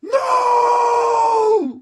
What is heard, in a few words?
were human hurt; minecraft; technic